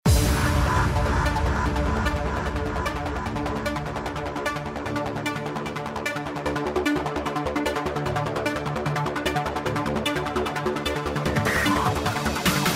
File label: Combat; MicroVolts Recharged